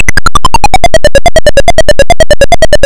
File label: falling; jet set willy